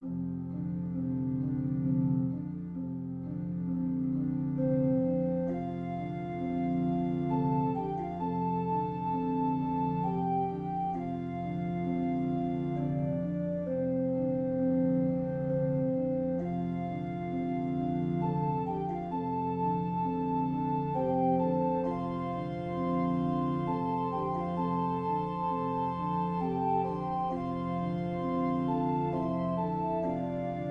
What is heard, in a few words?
Amazing grace meditation